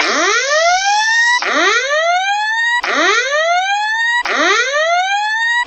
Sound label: Siren Loop; Sound FX